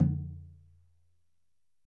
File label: MALAY DRUM; Spectrasonics - Heart of Asia CD2